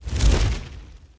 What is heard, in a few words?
Catapult